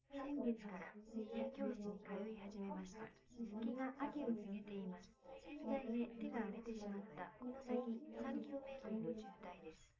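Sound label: female, live recording 250ms 5cm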